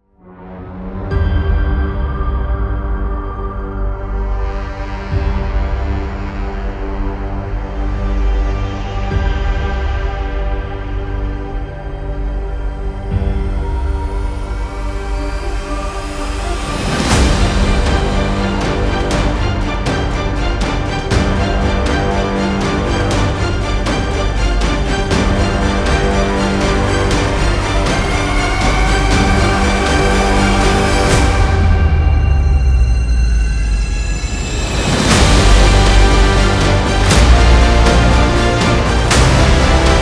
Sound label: Cinema Trailer; epic background music